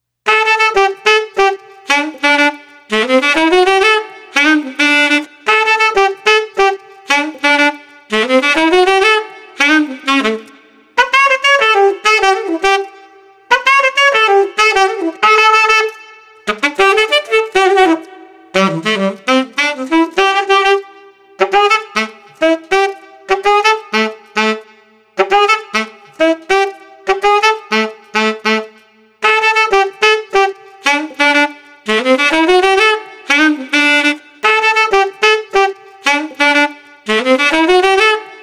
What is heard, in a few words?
Street Alto Sax Carefree 100